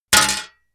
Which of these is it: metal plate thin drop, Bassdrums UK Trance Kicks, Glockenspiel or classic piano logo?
metal plate thin drop